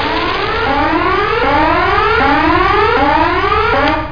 ALERT

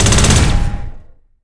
auto can; StarSiege

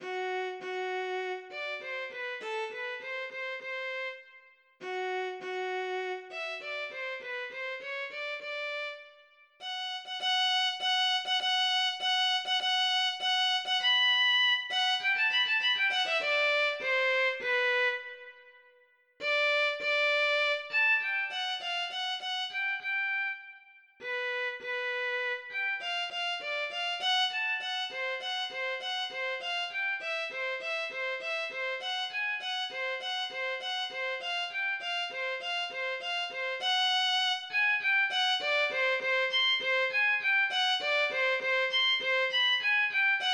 viola

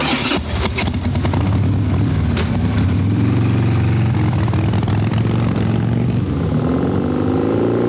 HARLEY; Spanish Net; MAPAROO

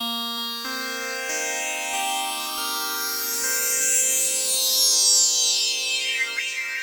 Synt; Evolution 079

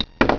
Pawn falling, Chess; Outland Games

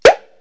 loud pop; Wired for Sound Pro CD; Aristosoft, Inc.; pops

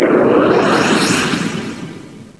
Whoosh; 3D Ultra Pinball Demo; Pinball Demo Music

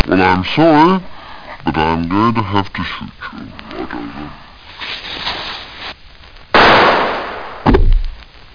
shoot

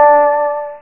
Match; Jewelbox 1.0

